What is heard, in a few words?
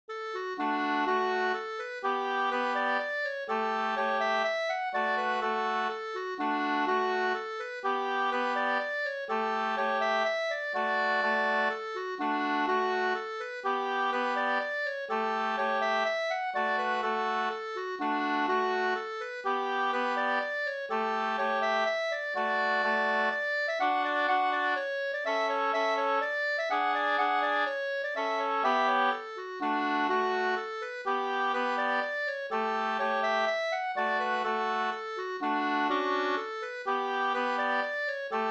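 polska, two-part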